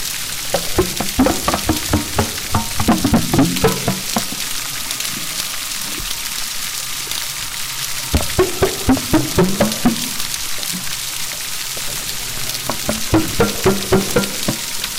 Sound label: graywhale